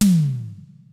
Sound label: MEGA KIT, DRUMS; Kid Nepro; Elite